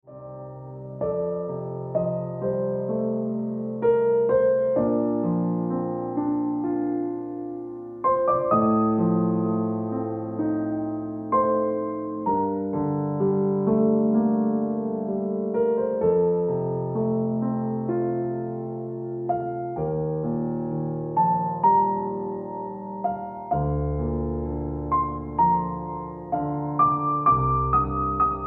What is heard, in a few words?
Piano Music